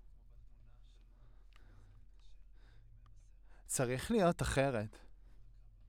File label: Voc OD